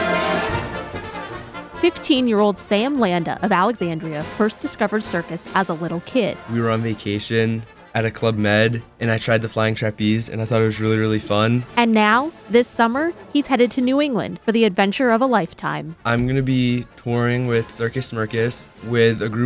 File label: circus